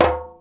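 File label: 100 JEMB, djembe; Univers Sons; Basicussions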